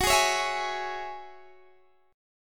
Gb 7th Suspended 2nd Gb, Guitar Open D; chordshape; down

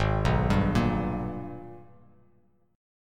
Ab Minor Major 9th, down; AbmM9; arpeggiated

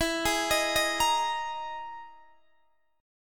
E Major 7th Flat 5th E down Guitar Open D

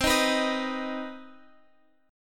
C Minor Major 7th, Guitar, Half Step Down

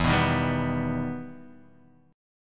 E Minor Major 7th E down Guitar DADGAD

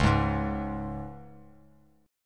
Db 5th Db down Bass Standard; Db5 strummed; JGuitar

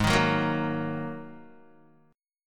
Ab 7th Suspended 2nd Ab, Guitar; Ab7sus2 strummed; 3,6,6,3,6,x

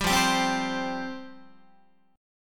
F Suspended 2nd F Guitar; Fsus2 strummed; JGuitar

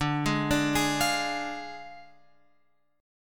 D Diminished D

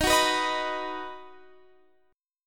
B Major D sharp

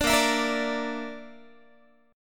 A sharp Major Flat 5th, Guitar; A#Mb5; strummed

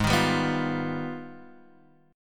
Ab Minor Major 13th Ab, Guitar Half Step Down

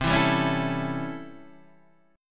A 7th C, chord; A7/C; strummed; JGuitar

